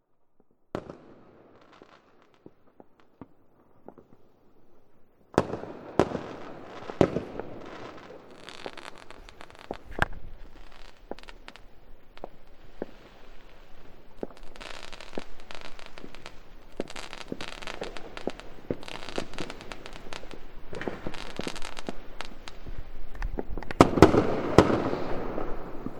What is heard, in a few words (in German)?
Silvester